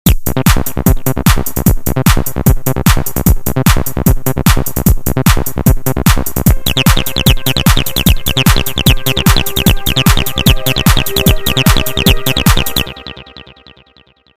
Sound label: 3o3